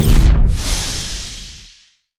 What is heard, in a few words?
steam golem overdrive; LoL; blitzcrank